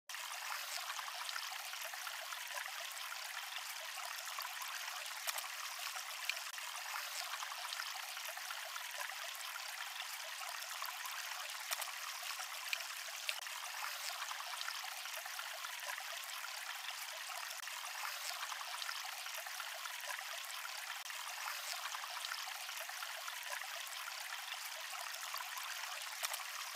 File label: The Water Flow of the Rice Field Canal Irrigation